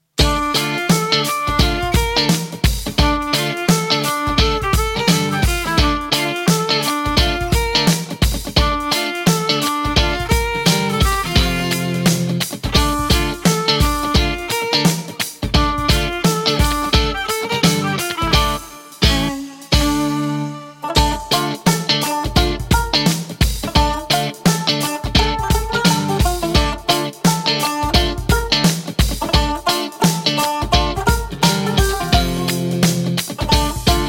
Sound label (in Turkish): Buradan Yak (Violin)